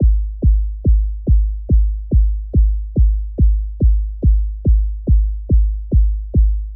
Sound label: filter kick 142 bpm